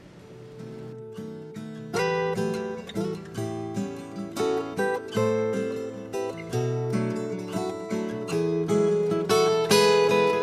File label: solo flamenco spanish guitar